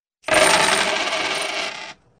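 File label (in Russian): высыпают на стол несколько крупных монет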